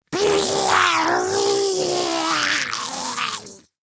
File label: Arm Pain Grineer Ghoul; Grineer Ghoul Expired; Battle Barks